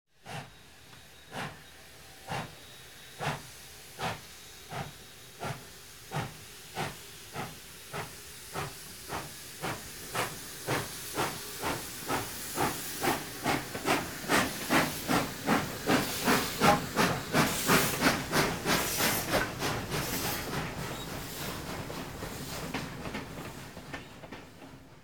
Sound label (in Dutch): Stoomtrein Interieur, verkeer algemeen; KMP Film Video Sound Effects